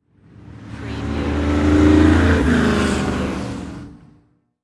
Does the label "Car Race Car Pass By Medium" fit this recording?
Yes